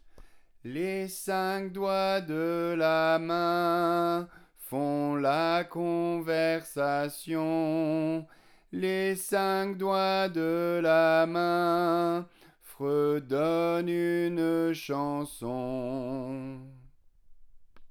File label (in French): couplet